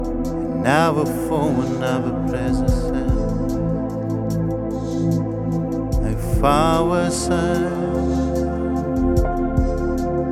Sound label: voice vocaloid